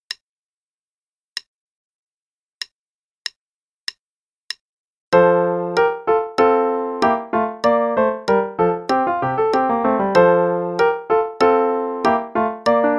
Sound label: Rondo in F Major (qn=96); Play-along; Hook